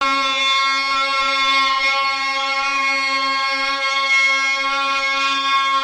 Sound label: vuvazelas; pacificpelican us podcast